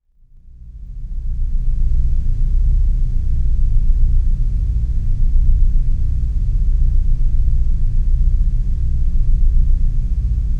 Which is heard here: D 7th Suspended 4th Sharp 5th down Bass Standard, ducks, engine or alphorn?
engine